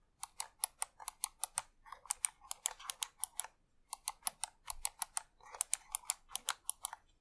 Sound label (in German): Seiten und Schultertasten